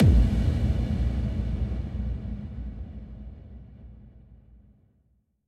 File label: impact; osu